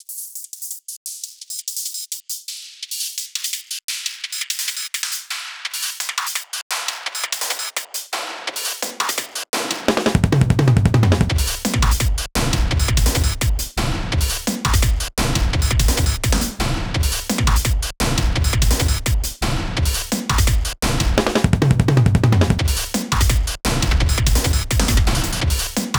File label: Night theme Drums; GGJ20